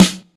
short acoustic snare f sharp key

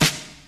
shiny snare sound D# key; Duration 0:01; snare drum samples

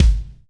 loud kick drum g sharp key

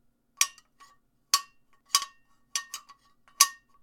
smashing metal - metallic furniture

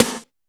airy sizzle acoustic snare a key; Duration 0:01; Mono

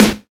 dry acoustic snare g sharp key

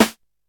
dark snare single hit a key

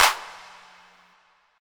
clap single shot c sharp key; single hits one shots

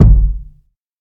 big bass drum g key

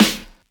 airy steel snare drum g sharp key

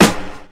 snare drum g sharp key; snare drum samples; one shots

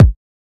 verby kick single shot b key; kick drum samples

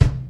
clean kick drum sound c key

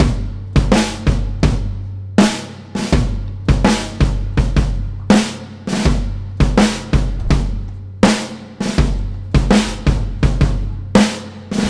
82 bpm breakbeat f sharp key

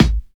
2000s studio kick d key

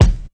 clear kick drum sample d sharp key

